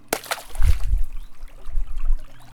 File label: Water Hit